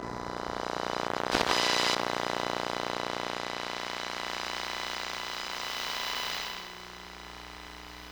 Cell Phone, electromagnetic sounds; Micro Pack; NazdyNate

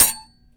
Silverware Ting; BB Retail Therapy Sample Pack

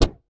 shot, retro synth

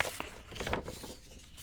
Paper Flutter; Micro Pack

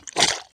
mud

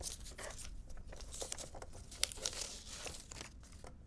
Paper Scraps, paper cutter; Micro Pack

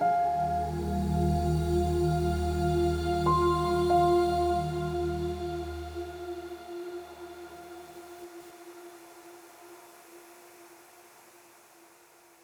Longfade, Pads; BB 2HTC Samples Vol 4

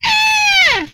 Death, Vocal; retro arcade

